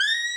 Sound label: SAX XSAX, Saxophones; All Saxes